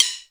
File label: STICK, DRUMS; Crypton - DrumBase5000; T1 DRUMS